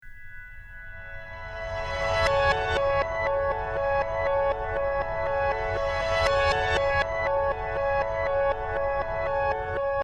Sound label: piano